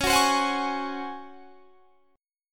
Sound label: Db Major 7th Suspended 4th Db down; strummed